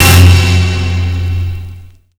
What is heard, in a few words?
F#, Strings, Drum & Bass; Stabbed 165; Total Drum&Bass